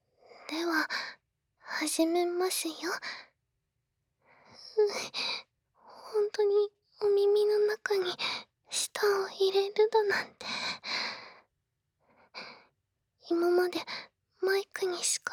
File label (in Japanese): リアル耳舐め